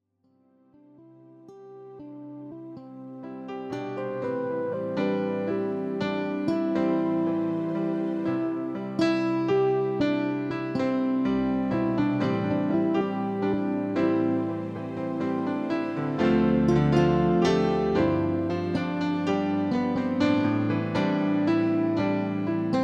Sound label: synth song